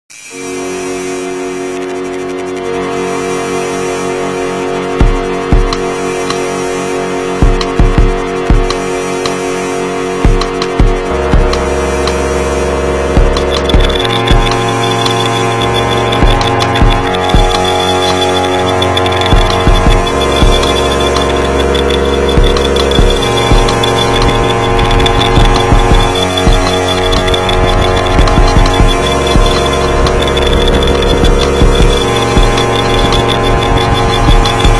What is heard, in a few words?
Instrumental